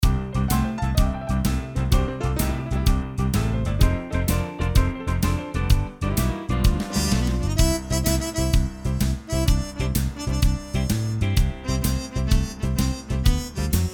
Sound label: Blues shuffle; AiR Symphony